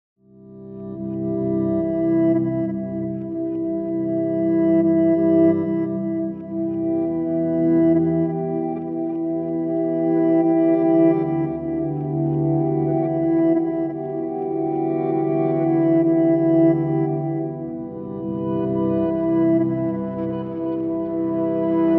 unstable guitar, vintage sounds and textures and full of soulful imperfections; EARTHY TEXTURES; We grabbed an Jazzmaster, hooked it up to some nice pedals, and recorded 3 great guitar pa; CINEMATIQUE; KLANG